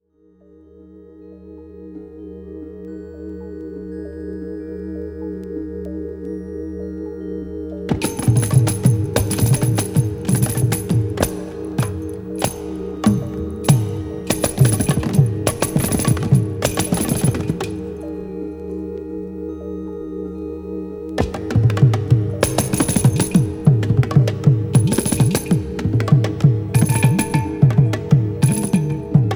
oriental wind

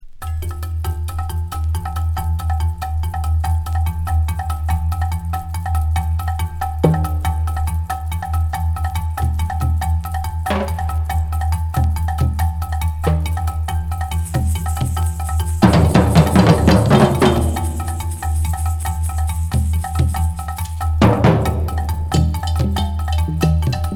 family of percussion

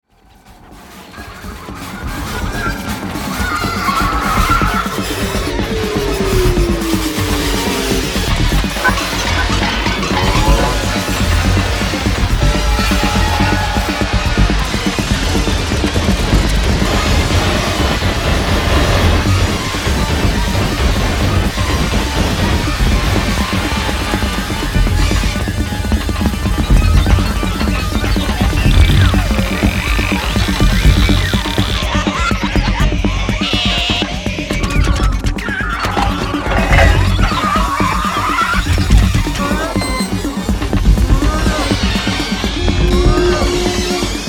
rhythm and noise

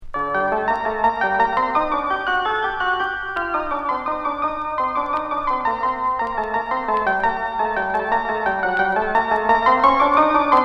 orchestra

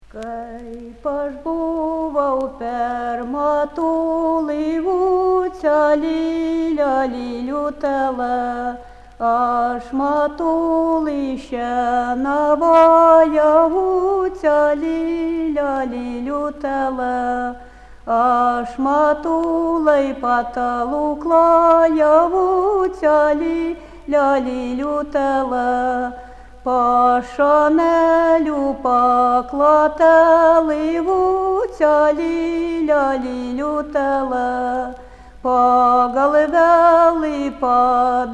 lithuanian folk songs

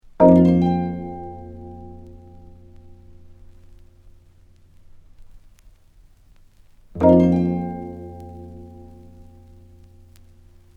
studio percussion

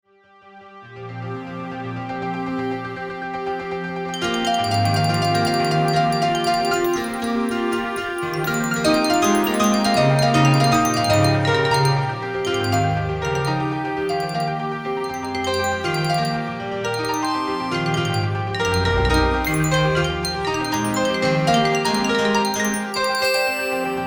machine messages

